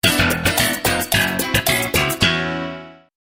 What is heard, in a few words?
sitcom transition bass riff; seinfeld